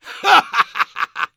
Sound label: laugh happy, es; Soldier